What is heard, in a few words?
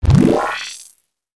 science fiction machine object obliterate